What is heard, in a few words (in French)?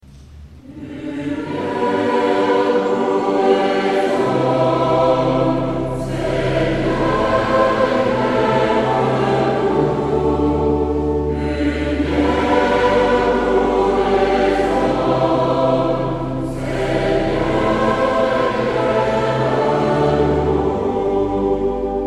Tutti; Lumiere pour les hommes